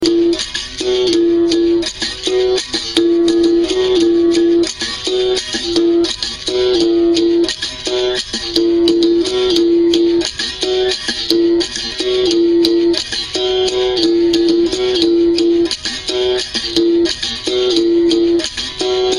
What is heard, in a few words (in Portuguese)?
toque de sao bento de angola